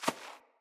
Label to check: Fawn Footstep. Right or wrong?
Right